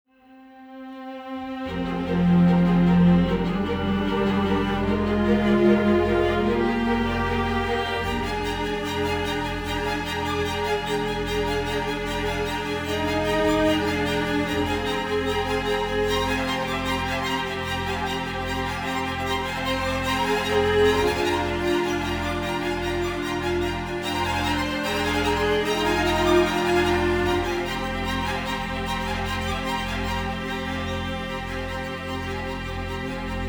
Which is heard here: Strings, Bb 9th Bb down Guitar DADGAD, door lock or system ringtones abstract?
Strings